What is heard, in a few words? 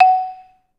MR LONG F#5, Advanced Orchestra; Best Service